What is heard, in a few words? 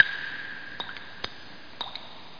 DRIP